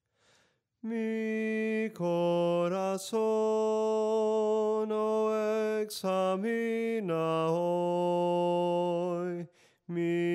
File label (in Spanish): SOPRANO CANTADO, himnos en melodia soprano